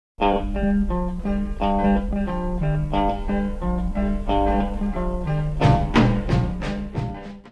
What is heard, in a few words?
Blues Bass